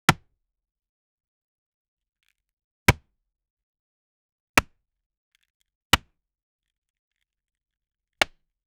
bullet impacting wood; impacts

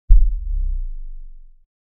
deep gelatinous thud, cartoon

